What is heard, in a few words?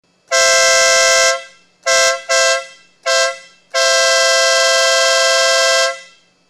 Motorcycle; soundbomb